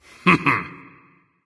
"heh heh"; smite; voicepack; ullr

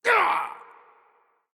hitreact human male large; smite; npc; roman archer

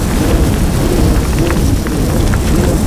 fire loop; smite; Ares

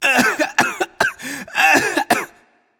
clear throat; smite; voicepack; janus

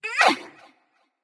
grunt jump light; smite; voicepack; hel

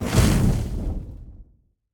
hit; Smite; god Khepri

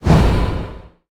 roll intense; smite; god geb skin7

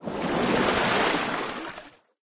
step; smite; poseidon

